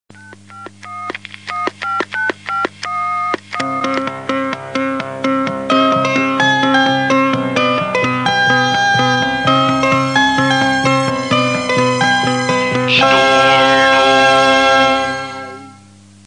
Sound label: Jingle Clean